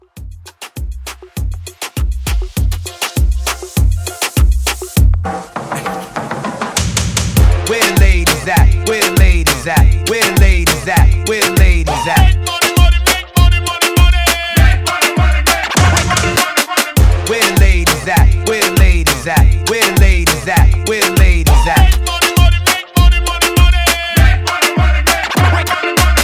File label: Moombah Hype